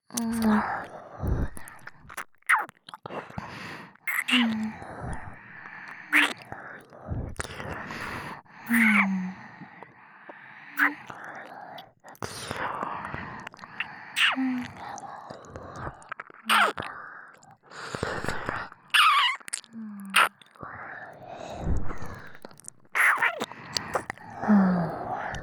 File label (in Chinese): 满满的耳朵亲吻; 时雨姐姐也想要抱抱; NG音声; CV.逢坂成美